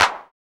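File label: CLAP909, TR-909; 90 s Sample CDs; Sound & Vision - Gigapack I CD 1 (Roland); TR-808 & 909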